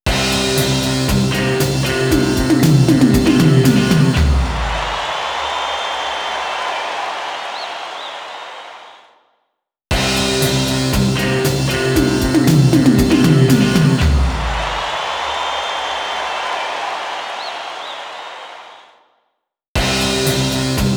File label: Guitar; G Sting Costume Guitar; Gremlins Gizmo; wii